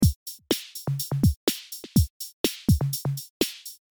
beat

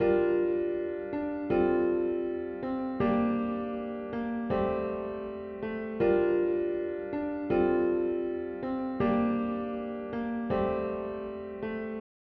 Piano

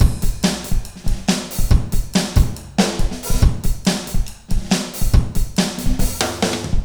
Beat

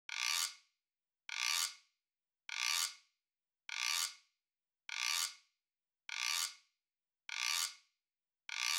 Guiro

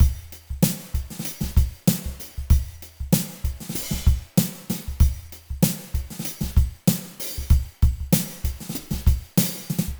Drumbeat degraded; Mixter Plus Drumbeat

